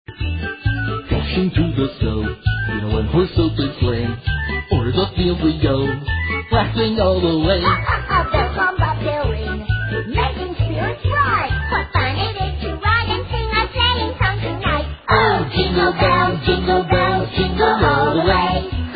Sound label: jingle bells; navidad